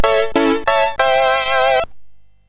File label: Jingles, sid MOS6581R2